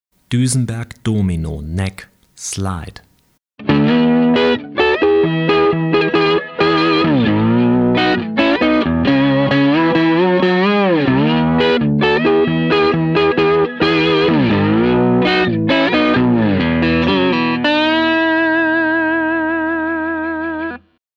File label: Duesenberg Domino, Neck PU, SLIDE